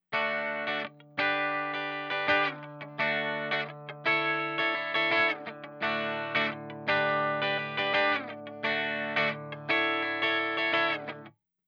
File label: reverb without